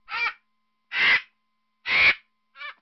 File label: Vulture, Кумай – снежный гриф; Название снежный гриф введено Пржевальским и Северцовым; Облик птицы напоминает белоголового сипа, но у кумая белые подкрылья и тон оперения светлее.